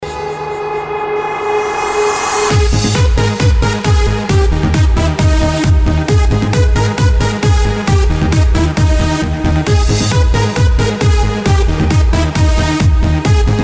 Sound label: funky groove